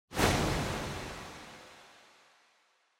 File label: Camera Motion Reverb; シーン・カメラモーション